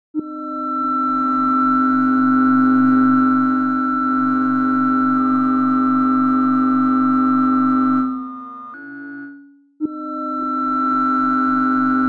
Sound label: Alien Noise